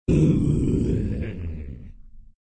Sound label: Zombie Alarm; Bob the Robber 2